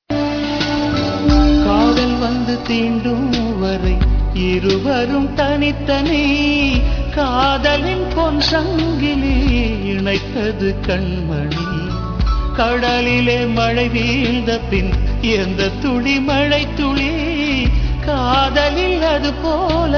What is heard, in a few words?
tamil love ringtone